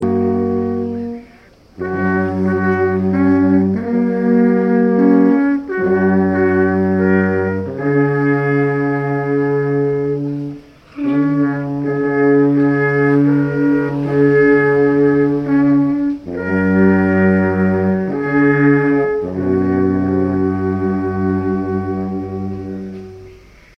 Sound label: alpenhorns